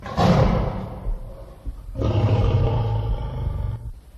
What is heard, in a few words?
lion; Cirque